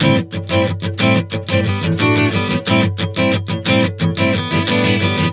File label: Strum 090 Am, One Chord Strumming 090 bpm